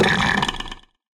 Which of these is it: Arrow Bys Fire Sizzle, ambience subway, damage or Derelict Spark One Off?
damage